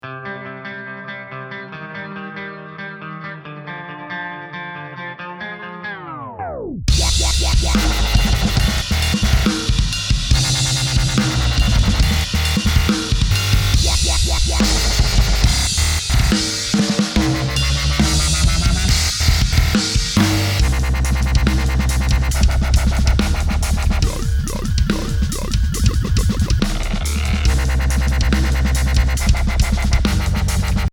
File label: intro into wobble